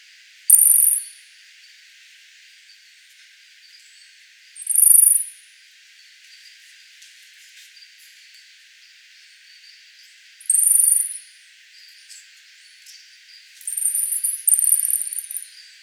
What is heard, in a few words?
Cebuella pygmaea long